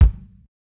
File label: Kicks; Touch Loops; CosmicFunk